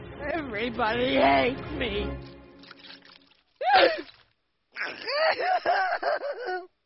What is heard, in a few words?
Sad Sound Effect; Ren Stimpy